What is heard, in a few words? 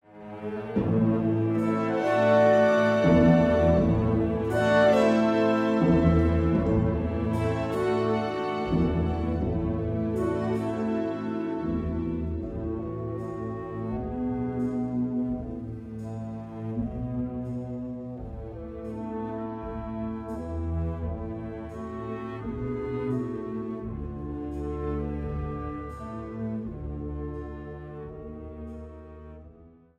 piano